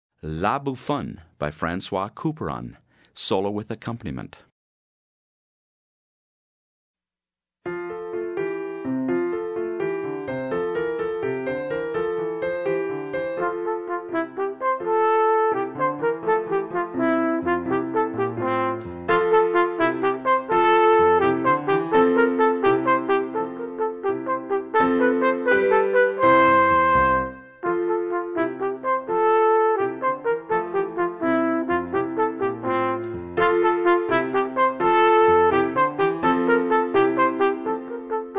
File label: Trumpet and Accompaniment; Grade 11 Solos